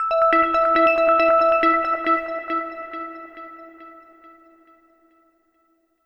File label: Overlay Tone